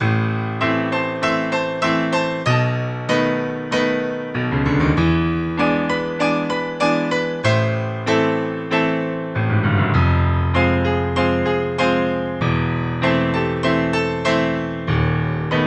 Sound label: dramatic